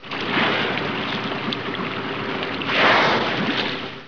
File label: whale surfacing sounds